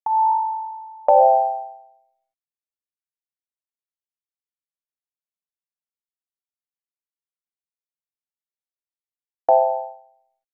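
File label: multiple notifications on s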